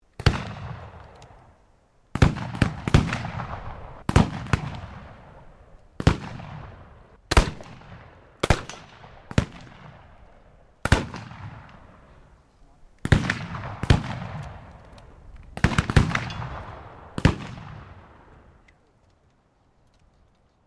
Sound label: MUSKETS BLACK POWDER GUNLINE SEQUENCE, at a shooting range; Black Powder firearms at a shooting range; Gun Shots